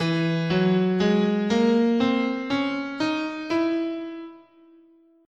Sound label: E Lydian Augmented, scale